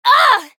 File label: Voice attack